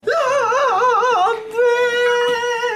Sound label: ahhhhh; hyunjin